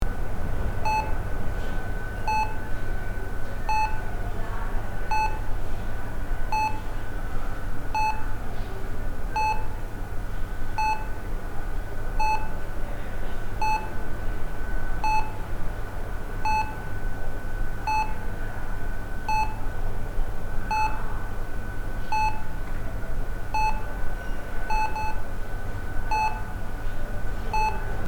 Hospital interior ambience heart monitor beeping loop